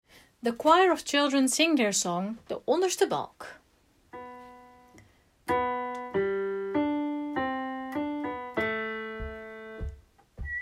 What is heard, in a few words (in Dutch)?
the choir onderste balk; kerst